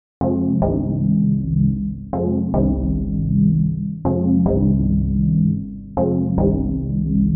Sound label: Chords; PatchFactory